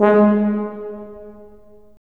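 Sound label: brass tuba; LA Composer vol. 3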